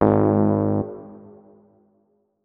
notes, keyboard, electric piano

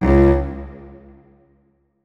notes, keyboard; Hideout Furniture

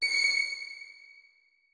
notes, keyboard; Hideout Furniture

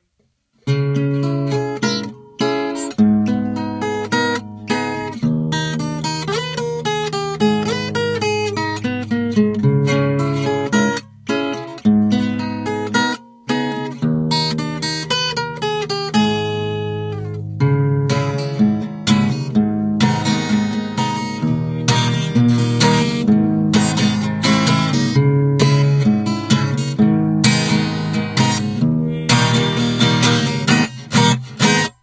guitar; Soundscape Overhaul

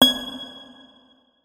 notes, guitar; Hideout Furniture

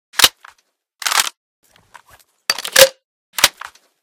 reload, weapons m79; M79 Reanimation; librarian m79

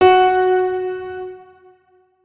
notes, keyboard piano; Hideout Furniture

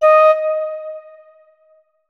flute notes; Hideout Furniture

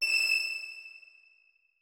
notes, keyboard strings; Hideout Furniture

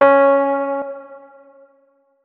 notes, keyboard, electric piano

